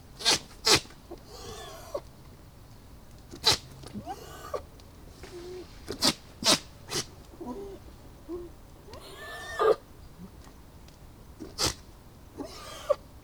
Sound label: Capra domestica male; Billy-goats don't bleat, but snort and sound hoarsely; During rut, the billy-goats often go with one's tongue hanging out, and snort loudly through the nose